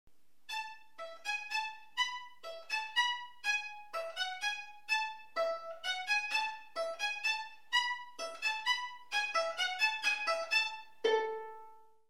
Melody, violin; Fitzpatrick; Violin Melodies

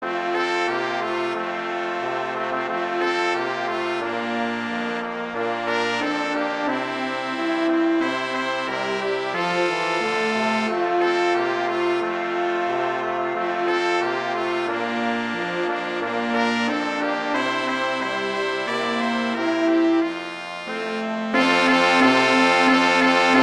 Jingle Bells, Christmas; Christmas Brass

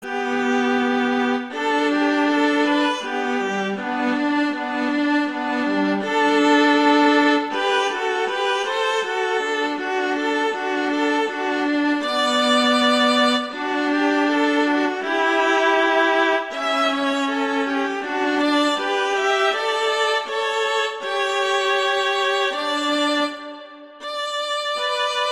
Duet; Mozart; Duets; Vla

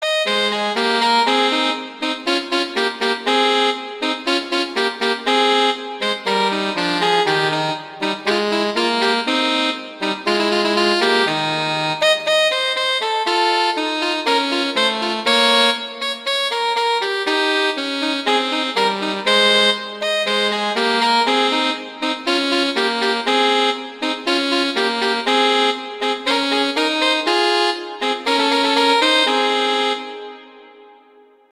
Duet; Mozart; Duets

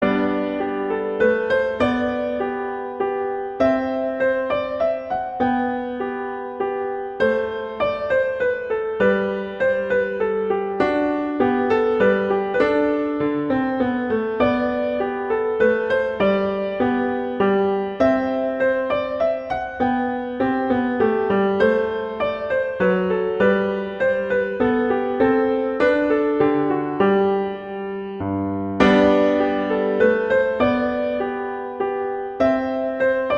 Minuet; 12 Pieces

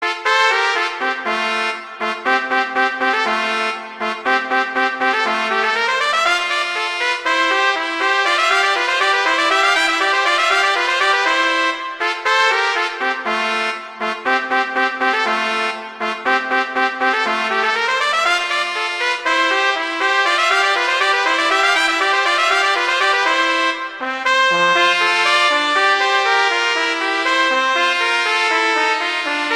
Duet; Mozart; Duets

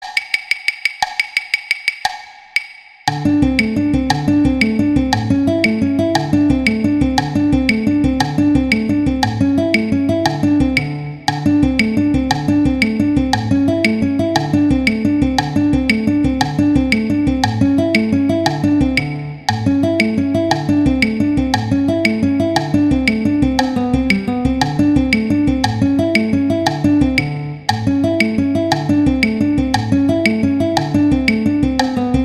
Allegro Violin; Paganini; Sonatas Op1 Vl Gu; Sonata No 3

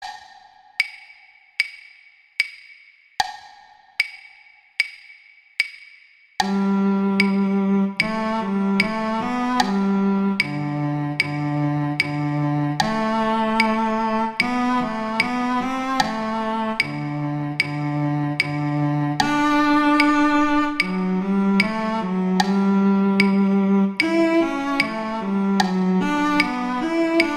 Cello II; Duet 10; Mozart; Duets

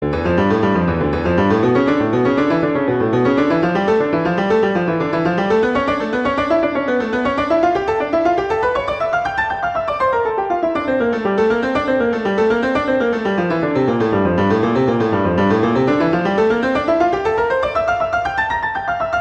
Exercise, D minor; Clementi; Preludes

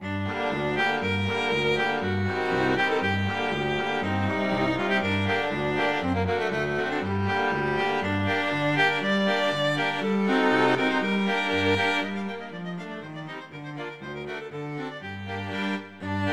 Hungarian Dance; Halloween Strings; Brahms

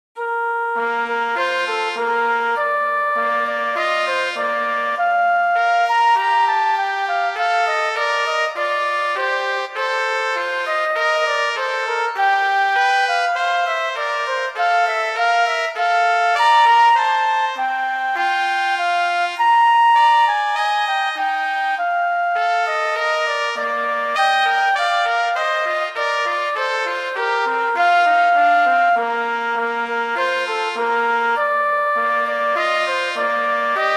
Duet; Mozart; Duets

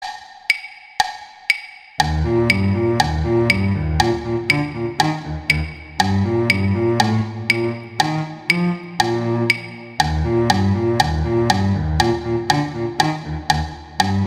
Cello I; Duets; Mozart

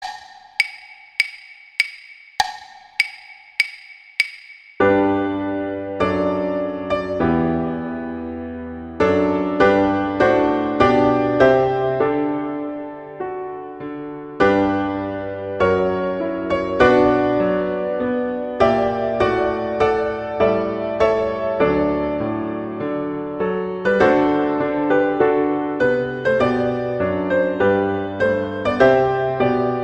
O Canada acc; Lavallee

